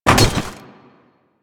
ledge grab; characters; generic foley; Warframe

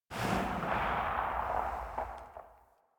Heavy Grenade Launcher Tail; Warframe; Archwing

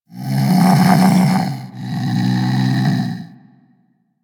Vocal Snore F; Warframe; Hunting Critters; Vulpaphyla

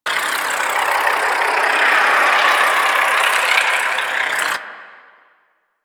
Infested Tumor Loop; Ambience; Props; Warframe; Corpus Infested

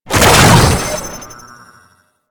Teleport; Warframe; Captain Vor

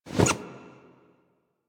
Investigate Putdown Plushie; Warframe; Cephalon Weave

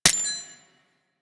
Mech, Weapons; Tenno; AK Bolto Prime

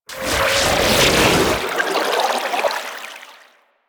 Kraken Cast; Warframe; Warframes; Pirate

